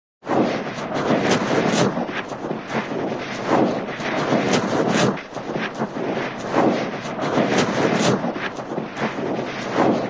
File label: roll, snowball giant snowball roll; cartoon